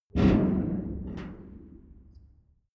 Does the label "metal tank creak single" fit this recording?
Yes